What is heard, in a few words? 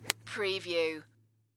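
Golf Iron Fairway Shot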